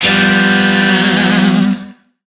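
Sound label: Shawzin Major Chord; Warframe; Lizzie Shawzin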